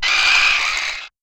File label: chicken flyer